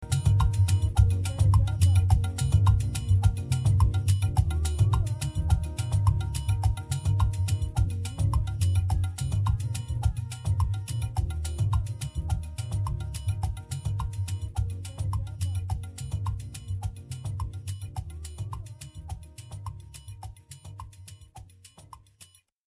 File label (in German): waldhorn